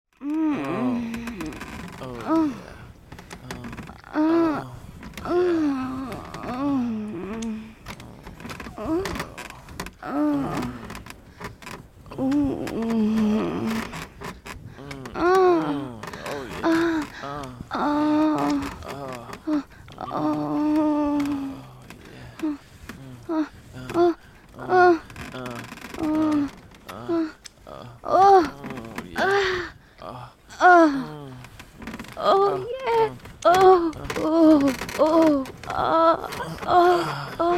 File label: Sex Scene Bed Moaning